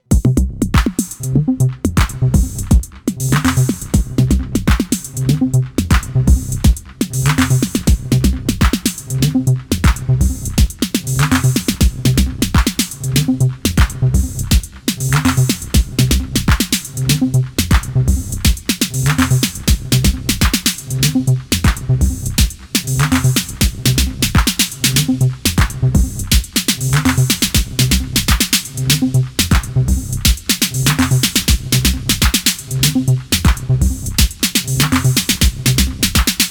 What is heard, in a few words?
Roland T-8 Beat Machine Snappy